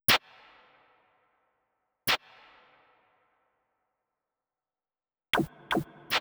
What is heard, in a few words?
120 Drum FX, Nu Jazz House; PowerFX